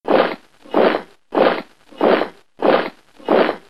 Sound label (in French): SOLDATS EN MARCHE